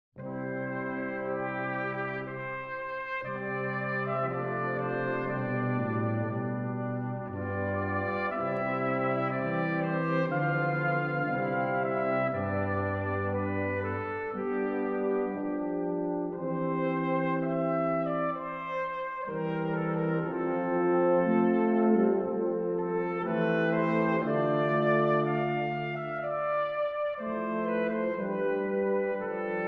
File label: Sonata In C For Trumpet & Strings, Brass; Mvt. 1. Grave; Baroque Brass